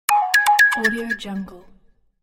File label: text message tone; alexander-blu